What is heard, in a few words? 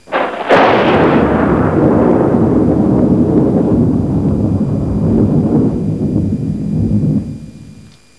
thunder